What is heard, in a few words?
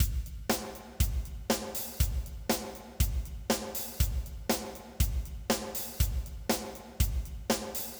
acoustic kit reverbed